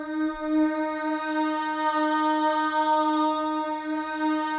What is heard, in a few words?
MOVING V2, Symphony of Voices; Spectrasonics